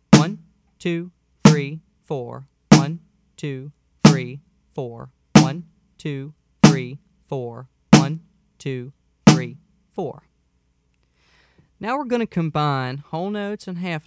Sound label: Half Notes